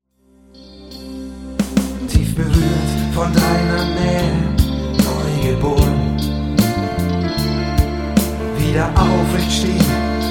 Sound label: pop; Roth